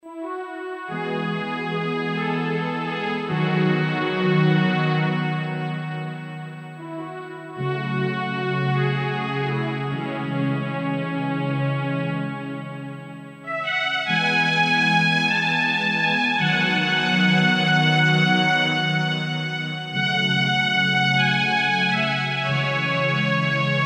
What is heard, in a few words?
angel soft